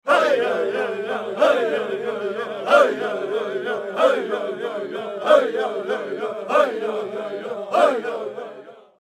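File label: Wild West sounds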